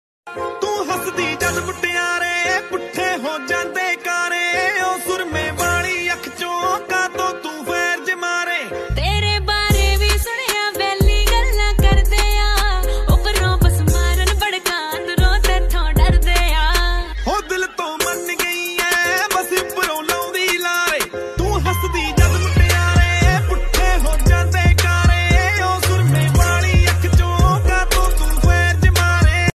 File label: Punjabi Ringtone